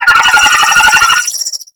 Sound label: Space Drone Attack; Warframe; Corpus Space Drone